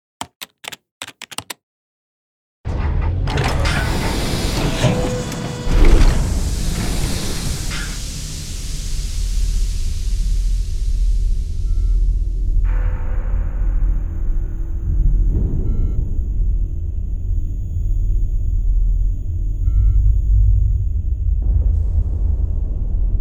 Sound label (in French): Le Vaisseau ambiance sans reverbe